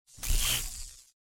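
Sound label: prolonged glitch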